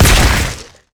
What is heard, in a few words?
carpet bomb; rumble skin03